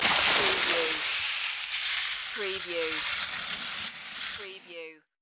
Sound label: Skiing, Snowboarding, Jump, slide and stop, distant